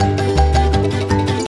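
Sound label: ocean world music cage forcefield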